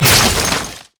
ice shard; LoL; lissandra

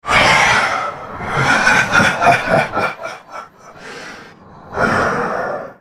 laugh; Nocturne

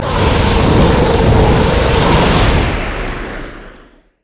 flamethrower; rumble skin03

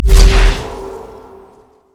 summonerspell base barrier; LoL

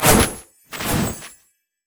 emote laugh; LoL; ahri

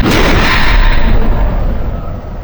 yasuo base quickdraw wind; LoL

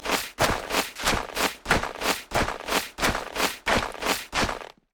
dance loop; LoL; aatrox base emote

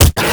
bear basicattack; LoL; annie base

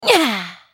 spell cast effort; LeBlanc

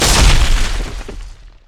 martial cadence attack; LoL; jarvaniv

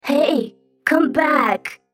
attack; BadRobotAmumu

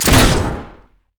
attack; jinx base

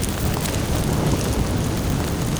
fireworks fire; Fireworks Corki; valkyrie

